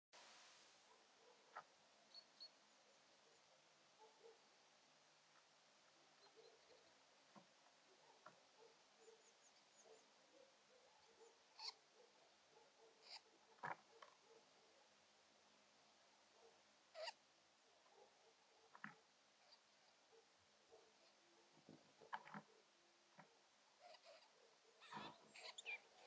Eurasian jay; جیجاق

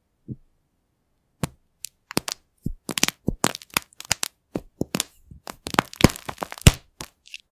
chalk crunch; له کردن صابون گچی; اسمر

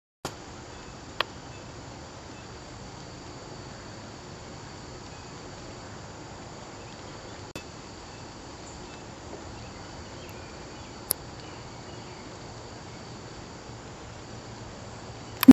cuban ivory billed woodpecker

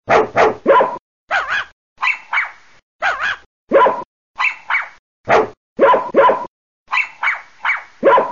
dog sound

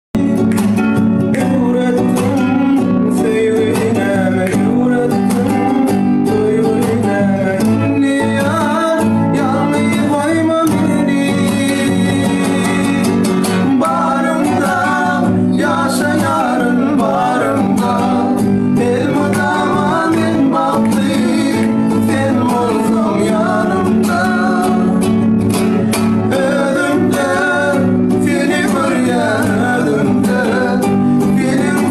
Turkmen ringtone

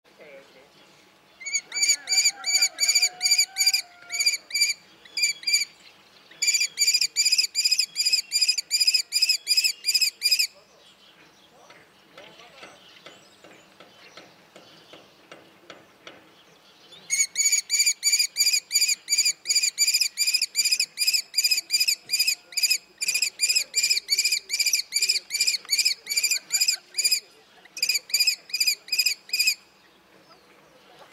kestrel sound

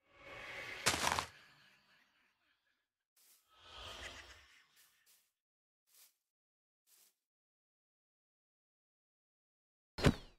Minecraft Vex

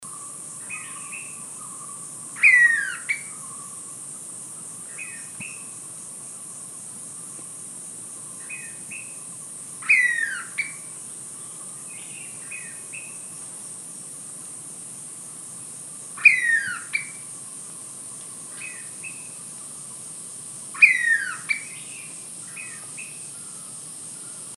blue pitta, پرنده پیتای کبود

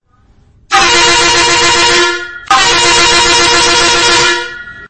Italian double horn

